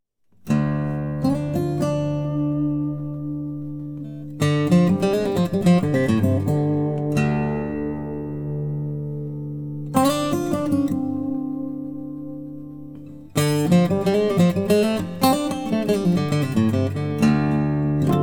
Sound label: Blues, guitar